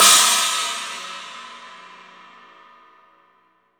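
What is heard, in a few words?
Chinese, crashs; House Side Of 2 Step; USB Soundscan vol.51; AKAI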